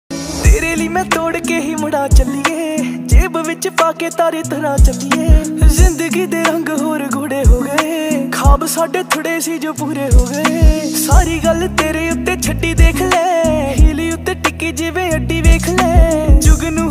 Punjabi Ringtone

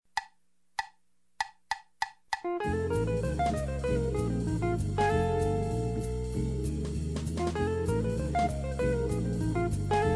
Jazz riffs blues; Barney Kessel type blues

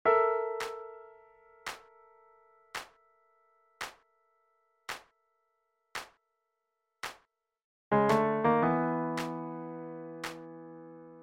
recorder melody and accompaniment

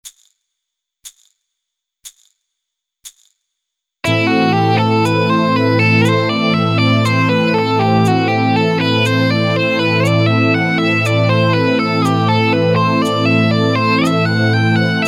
LEGATO STYLE

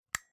light click

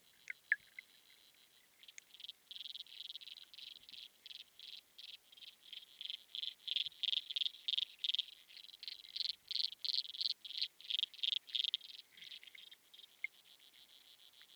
Pond Example; Pomona

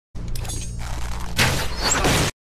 Arrow

